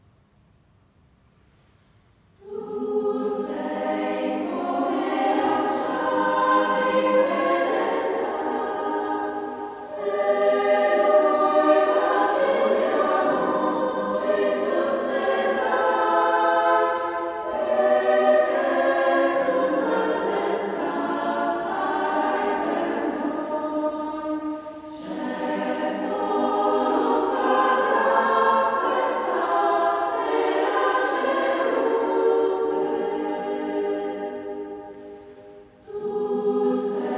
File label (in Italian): Tu sei come roccia, coro; cappella